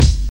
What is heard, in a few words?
old school snappy bass drum sound g sharp key, kick drum single shot